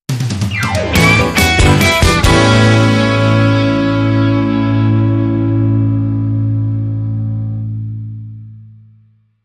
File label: Rock Club